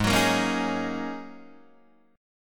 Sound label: Ab 9th Suspended 4th Ab down Guitar Open D